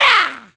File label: Bokoblin Kick; Zelda